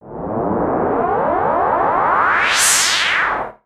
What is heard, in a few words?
Uplifter